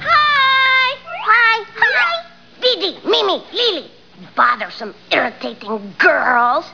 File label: hihihi